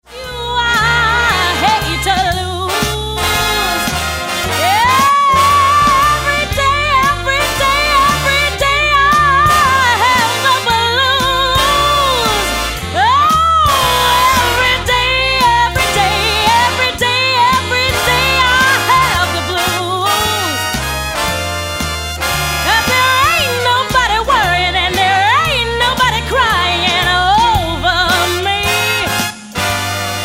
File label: blues, big band